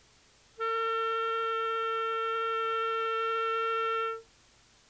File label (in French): clarinette